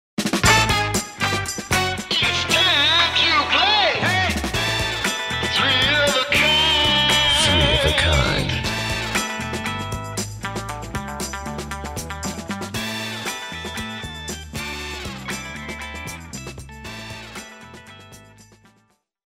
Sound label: intro, Motown